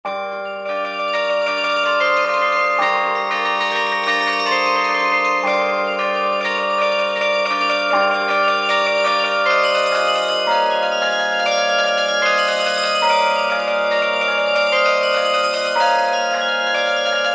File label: carillon; Recuerdo del Alhambra; Carillon de Douaï